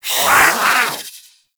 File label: Catbrow Feral Vocal Attack Medium, Enemies; Warframe